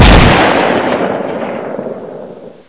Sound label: turret fire; GoldSrc